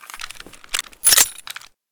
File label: tt33 misfire